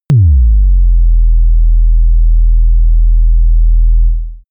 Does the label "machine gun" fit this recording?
No